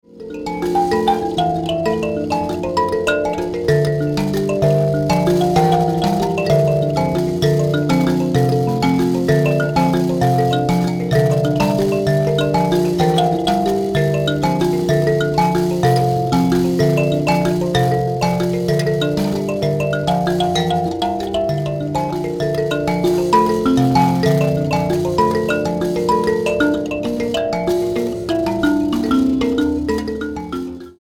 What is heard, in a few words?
mbira